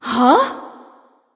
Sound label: confused